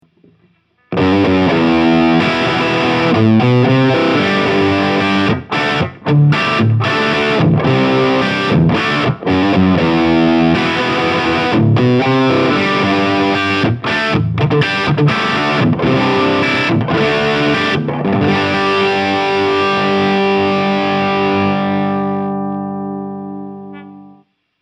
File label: Bogner Überschall Blue Channel